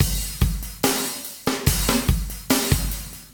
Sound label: Samui, Drum Loops, Processed; Zero-G Smoke